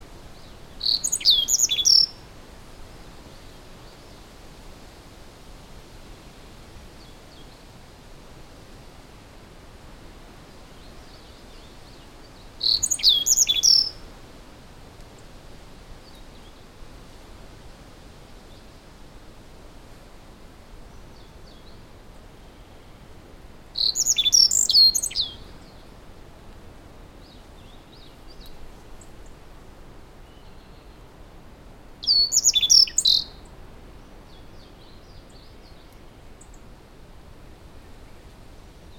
bunting black-faced Emberiza spodocephala spodocephala